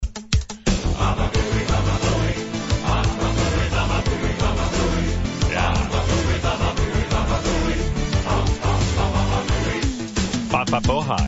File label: techno